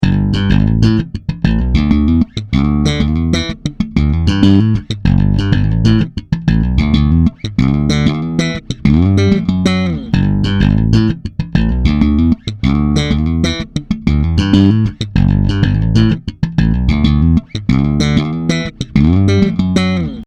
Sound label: Slap Back